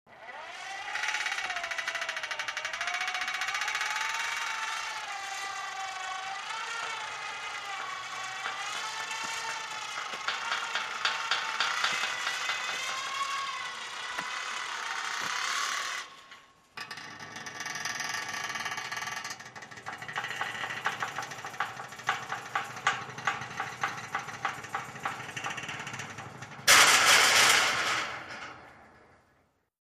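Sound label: Metal Gate Open Close Medium, metal gate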